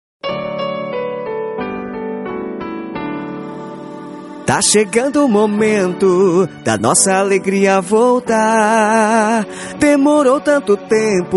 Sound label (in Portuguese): Jingle politico